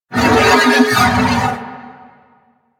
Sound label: Horse Vocal Screech Jump; Warframe; Vehicles; Duviri